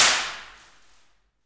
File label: PRC ALMWA, Aluminum Wall; Rhythm Section; FX Perc